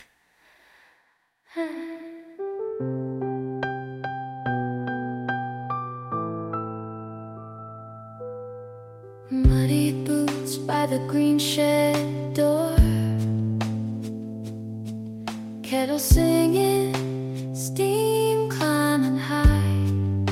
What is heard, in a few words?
birthday, name songs; carol